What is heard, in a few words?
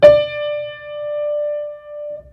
key, pianosaurus